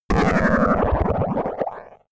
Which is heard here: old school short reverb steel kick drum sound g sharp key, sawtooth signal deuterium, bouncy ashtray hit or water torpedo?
water torpedo